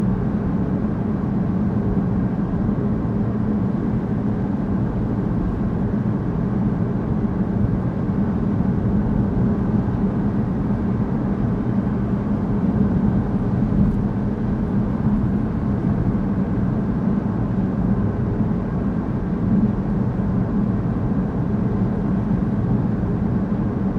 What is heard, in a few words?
electric car; OpenValley